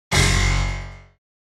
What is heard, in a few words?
Piano strings hit